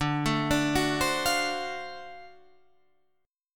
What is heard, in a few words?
D 7th Sharp 9th D down Guitar Standard; arpeggiated; JGuitar